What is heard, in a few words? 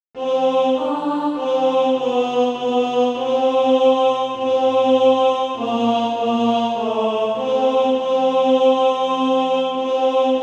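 tenor, SATB Practice Music; From Egypt Lately Come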